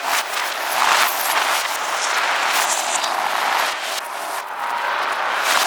odd grabbing loop; svencoop

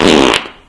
pfff; gearbox

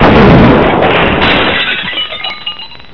blown out; svencoop

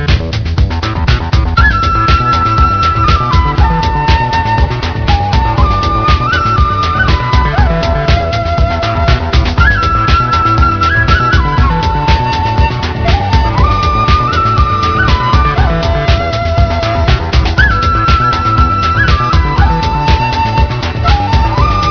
rave

